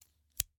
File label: Scissors, snap, snip, shear, medium, single; Eiravaein Works; Vaeyan IV